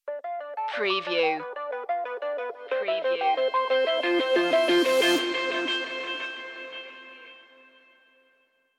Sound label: analog epic stinger